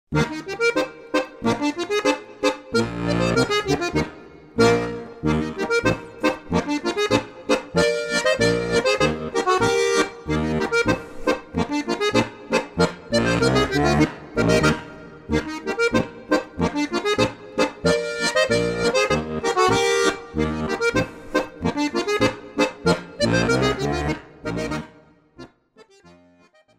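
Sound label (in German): Harmonika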